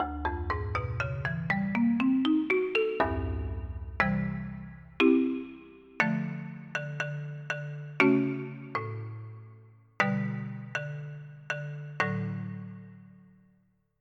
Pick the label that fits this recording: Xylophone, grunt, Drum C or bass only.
Xylophone